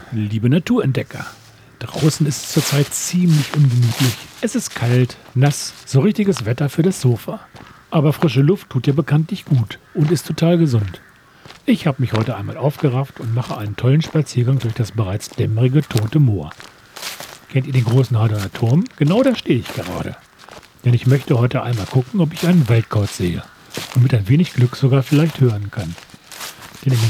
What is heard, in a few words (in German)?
Kauz, Totes Moor; Frank Behrens